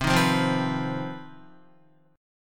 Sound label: C Minor Major 7th Double Flat 5th C down Guitar 4F 4A 5C 5G 5C 6E; strummed; JGuitar